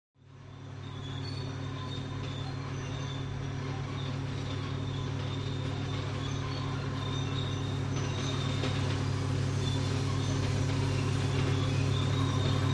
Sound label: Earth Mover; Earth Mover Up From Long Shot, Stop At Mic, Idles And Switch Off